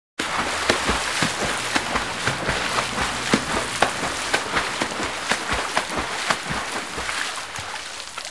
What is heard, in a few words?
swimming sound